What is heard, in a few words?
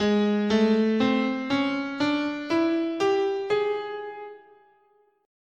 Ab Persian